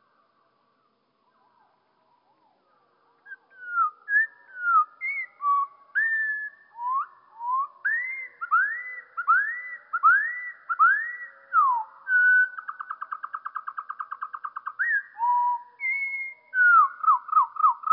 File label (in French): troglodyte moins 2 octaves; moins deux octaves; L'INDICATEUR N°4